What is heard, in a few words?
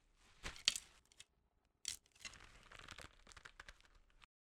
pulling arrow